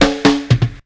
TR BREAK, Ragga & Dub Reggae; USB Soundscan vol.27; AKAI

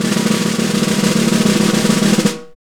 snare, Roland LA Composer; Roland - LA Composer vol. 1

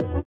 sitar, Indiatek; Spectrasonics; Metamorphosis

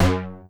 arpeggio; Masterbits; Vintage Voltage; SEQ-FX

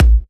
A, kicks; markus hakala kicks

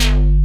HALIOSIS BS, BASSES, Synthsonic; Pro-Rec - Synthsonic

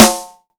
sfx perc

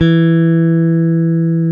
GTR, Dual Guitar; Club-50 - Foundations; Paul

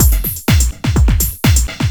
Beat, Drums & Percussion; Electrocution; Beats

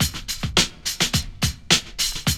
LOOP; X-Static Goldmine 2; IMIX LOOP

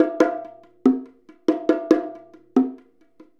Bongo; Essential Percussion

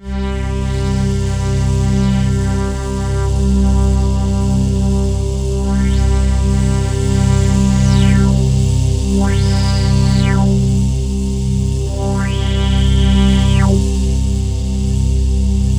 C2, Synth Line; 90 s Sample CDs; NiceBeats; SynthLine VOL-17

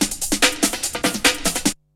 BREAKBEATS, TECHNO; Syntec; Wall of Sounds; TECHNO-BOX

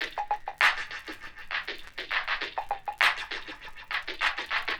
Ghost Loop 100BPM, drum loop

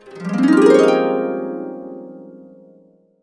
harp, -R; Complete Harps; USB Soundscan vol.62; AKAI

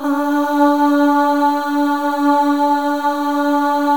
Voices, FEM AHS; Spectrasonics; FEM.1 AHS

